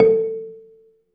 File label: BELA A3, BELAFON, Asian Traditions; USB Soundscan vol.60; AKAI